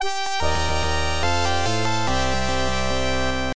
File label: Round Start; Arkanoid II Revenge of Doh; IBM PC XT AT